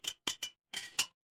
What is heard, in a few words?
glass ceramic